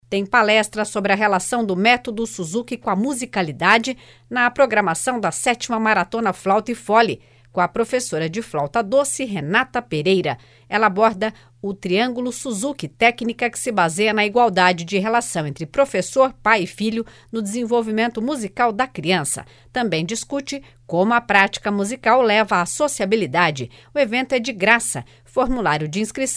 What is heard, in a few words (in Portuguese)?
FLAUTA e FOLE